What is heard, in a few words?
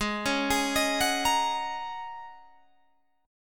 G sharp 9th Suspended 4th, Guitar, Open G; arpeggiated; 18,18,18,20,19,20; G#9sus4